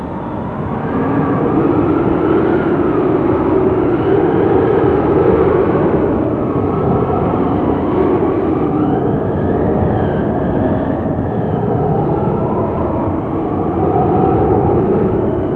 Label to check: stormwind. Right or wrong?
Right